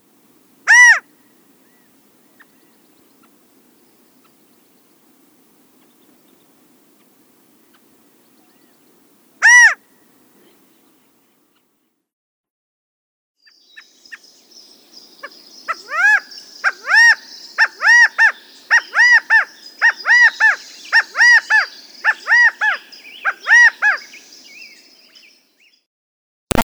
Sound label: California Quail